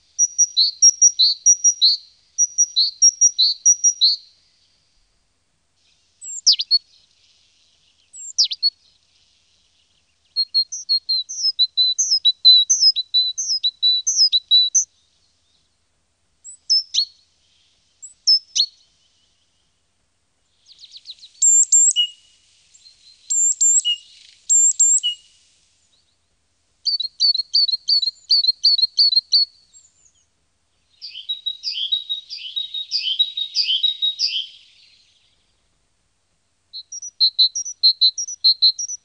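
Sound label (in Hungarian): Széncinege; Madarak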